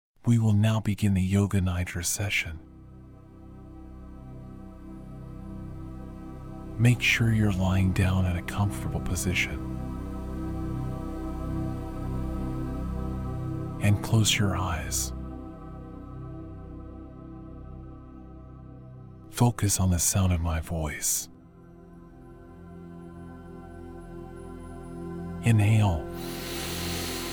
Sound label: 432hz - Cosmic Expansion - Masculine